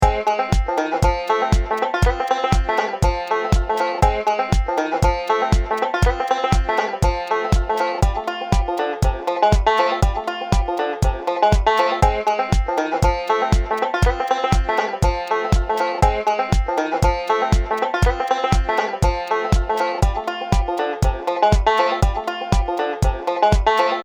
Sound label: Funky Hill Billy; Ringtones